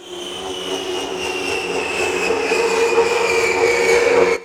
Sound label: weird; Best Service - XXL Production Effects